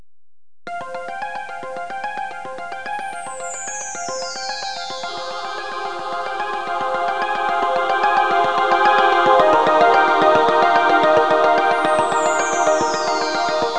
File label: Music; Barely 18; Carina